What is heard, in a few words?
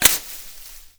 impact foliage; weapons; Modern Warfare